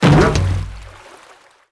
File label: Bump; Jonah A VeggieTales Game